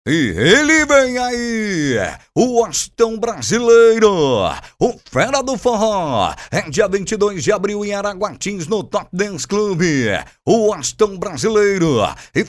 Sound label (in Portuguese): Rodeio